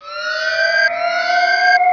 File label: siren, ambience